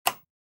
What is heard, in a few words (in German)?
Schalter